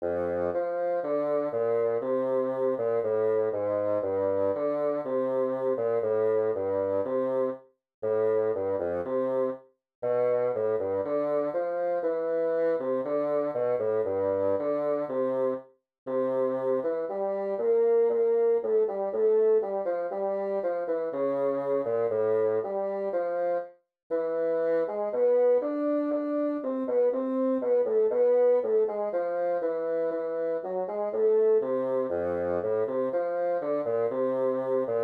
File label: bassoon etude, 60